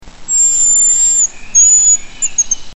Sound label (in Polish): jarzabek